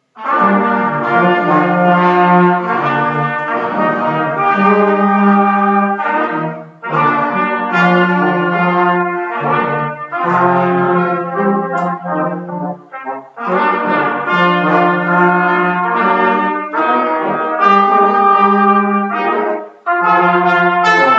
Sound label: Brass